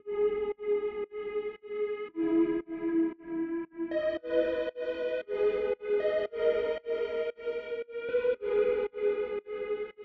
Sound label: 115 BPM G# Min Lead, Latin; Loop Stems; Cymatics; El Jefe